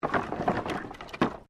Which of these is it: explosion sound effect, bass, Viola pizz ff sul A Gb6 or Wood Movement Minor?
Wood Movement Minor